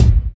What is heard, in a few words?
rich low end bass drum sample C key, kickdrum tuned to the C note